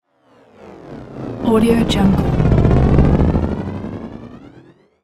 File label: flyby